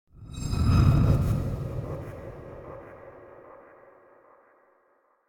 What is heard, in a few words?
Whoosh Tone By Heavy Echo - Finale; Hollywood Edge; sound designer's toolkit v2